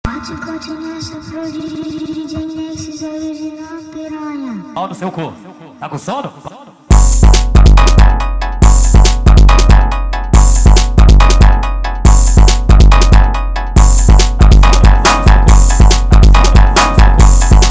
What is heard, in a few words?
808 sped Up, funk